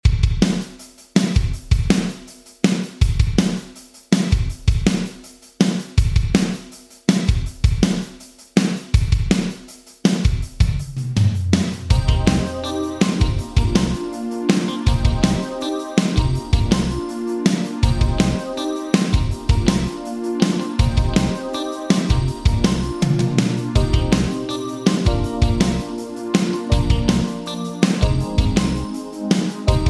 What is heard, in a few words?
Drums AMS RMX 16 Non Linear 2; Vintage Digital